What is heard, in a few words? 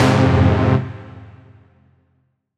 Fm Strong Dub, Chords; Electronisounds